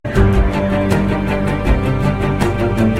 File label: Action Movie Theme Ringtone